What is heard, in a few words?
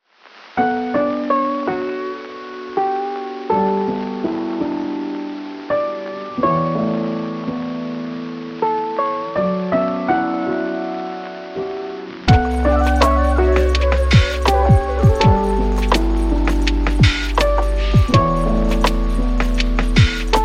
easel, lofi piano